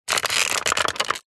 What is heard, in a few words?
plastic creak stress; foley